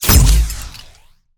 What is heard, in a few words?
future weapons energy gun shot single; sonniss gdc